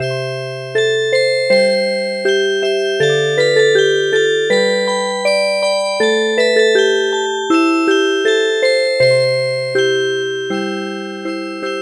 Music Box; Cha puk

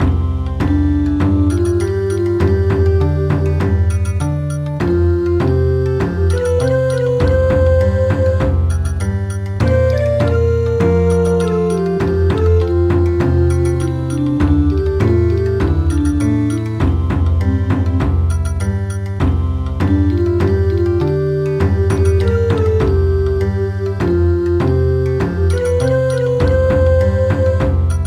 Bon Odori; Vande Mataram

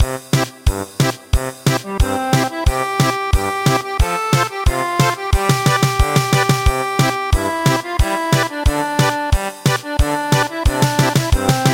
German Polka; Thai National Anthem

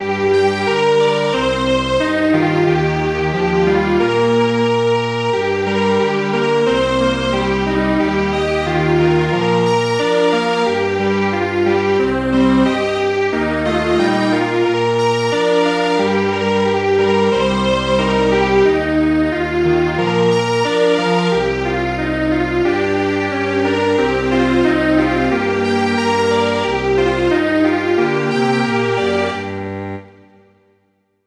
lullaby Strings Quartet; traditional; Thai

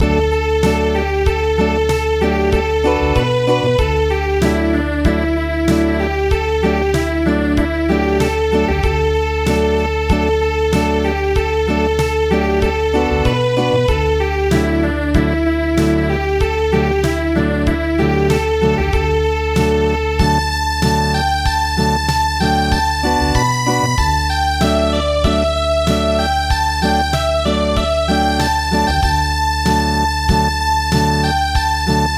Japanese Processional Tune Acoustic, traditional

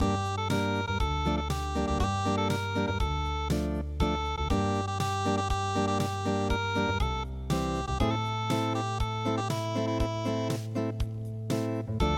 zuizui unpluged

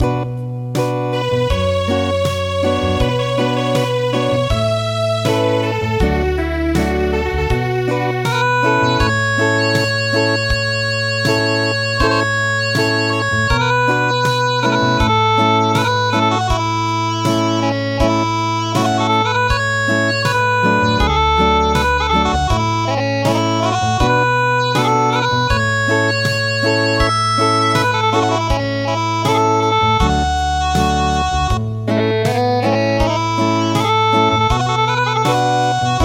Acoustic; Autumn Mountain Song

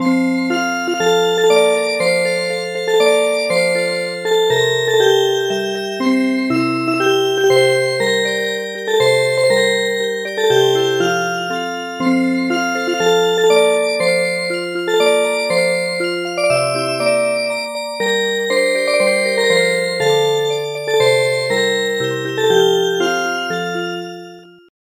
Music Box

